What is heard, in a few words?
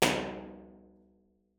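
bottle dungeon, impulse response, Falkland Palace; b-format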